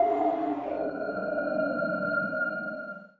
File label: Event Complete